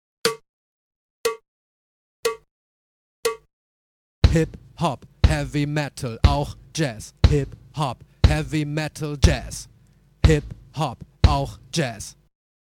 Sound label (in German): Uebung, schlagzeug